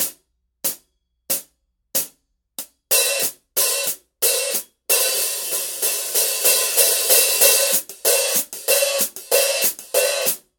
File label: hi-hat pattern